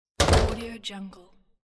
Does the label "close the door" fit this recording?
Yes